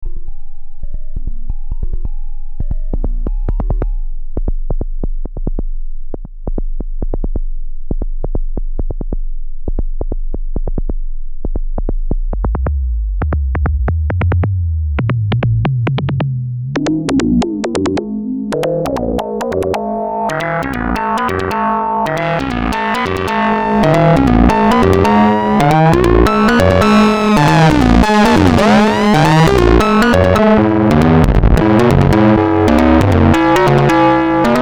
seq 2 env

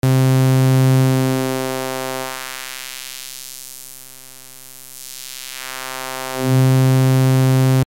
hpf no reso, synthesizer basics

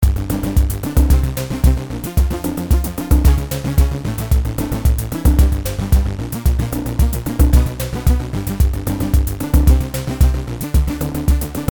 synth2; radias

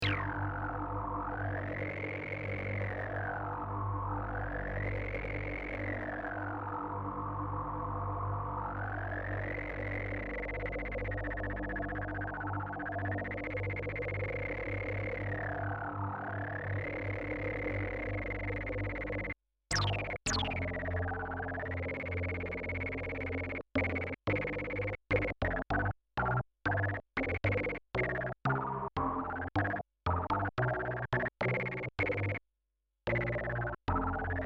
System1 LFO test; roland

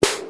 SD, vermona drm1

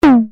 BD, vermona drm1

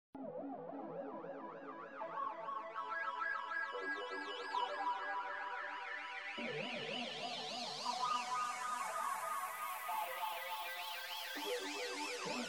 micro q, waldorf

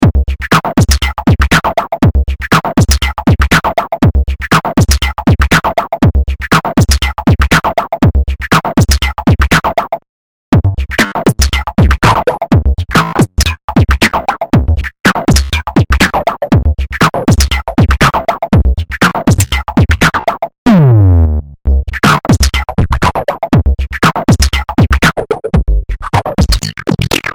drumset; Drumloop; Formant-Regler einmal ordentlich verschoben; CELEMONY MELODYNE 3-1

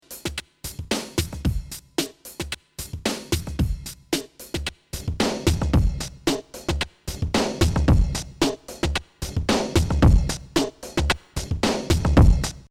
drum fat; sherman

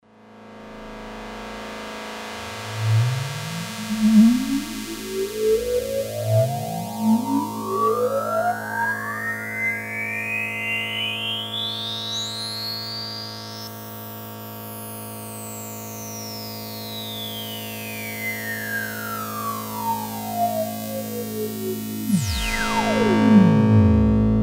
syrinx; synton

